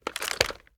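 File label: sniper reload fast up; gmod; iw8; xmike109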